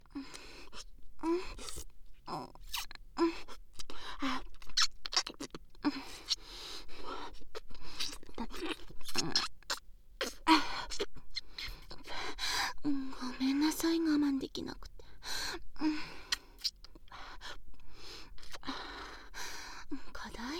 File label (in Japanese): ディープキス, ASMR